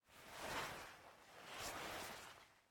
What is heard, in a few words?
Player Move Hammer Game Sit; MiSide